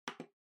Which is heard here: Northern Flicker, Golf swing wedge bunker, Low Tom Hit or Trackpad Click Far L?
Trackpad Click Far L